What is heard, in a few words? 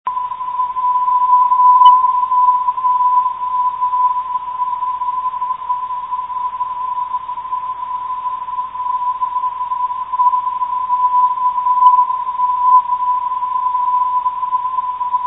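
312 kHz, non-directional beacon; cyp lbn syr ndb; kml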